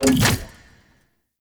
Hydraulus Exit; Warframe; Bard Quest; Music Hydraulus

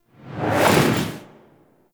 Foley Arm Whoosh; Warframe; Nineteen Ninety Nine; Quests; Hive Queen

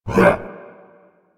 Infested Melee Grunt; Warframe

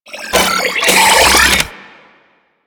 Reload, Weapons; Warframe; Cephalon; Ceph Primary

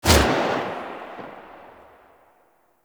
Burst Rifle Fire; Grineer

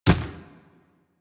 Infested Medium Run Dirt, footsteps; Warframe

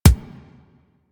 weapon punch pistol; Warframe; Weapons; Pistols

